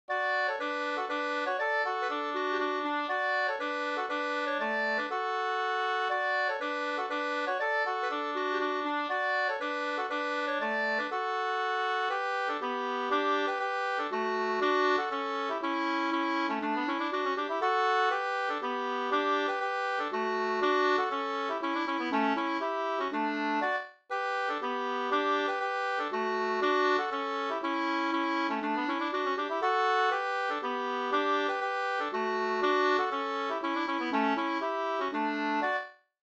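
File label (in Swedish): polska, tvåstämmig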